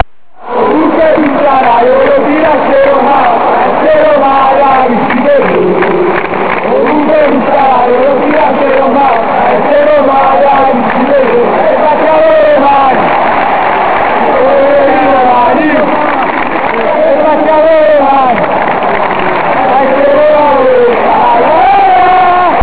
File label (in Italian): nuovo coro; romamilan; Roma/Milan 2004/05; Come on AS Roma, win for us (2 times) Let's clap our hands like true romans Let's clap our hands AS Roma olè